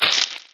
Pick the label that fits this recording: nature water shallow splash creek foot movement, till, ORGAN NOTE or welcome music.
till